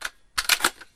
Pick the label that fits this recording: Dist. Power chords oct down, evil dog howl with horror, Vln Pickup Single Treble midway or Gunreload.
Gunreload